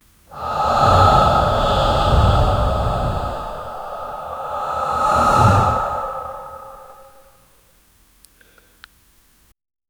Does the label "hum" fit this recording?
No